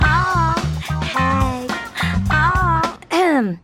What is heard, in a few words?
sexy song oh hey oh ahem; drawn Together; Foxxy